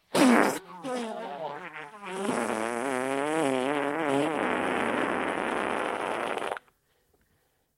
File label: wet ahhh fart